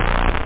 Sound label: Magic; da capo vol1; effects rave